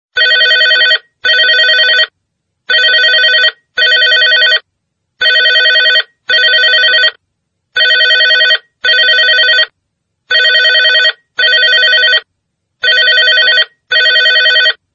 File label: Classic Ringing